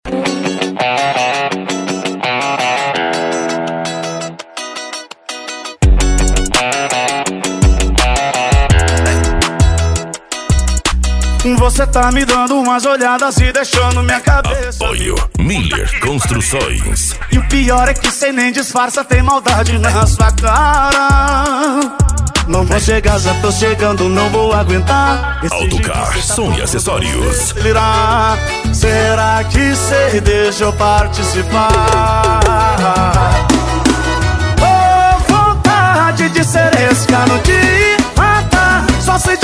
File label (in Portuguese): sertanejo e lambadao